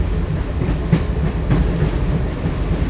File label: train loop; Soultree